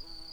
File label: bumblebees, Lucorum queens